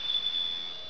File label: jingles